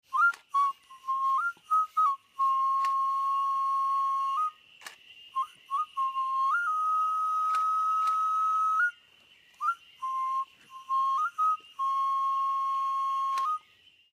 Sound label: whistle